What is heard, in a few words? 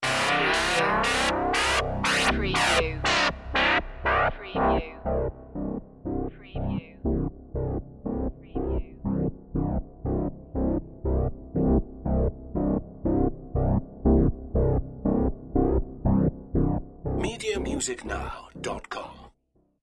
FM Power